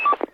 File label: Walkie Talkie Transmission Off